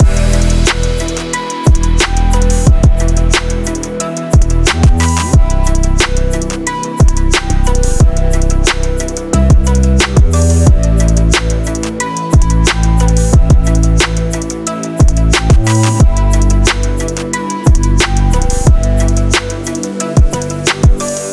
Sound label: technology extreme sport loop